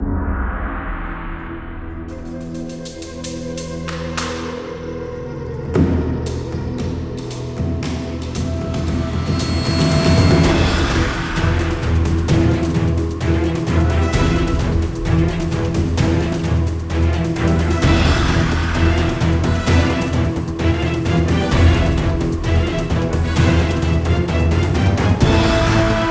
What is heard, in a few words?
confrontation; old scave boss; Magic GameClient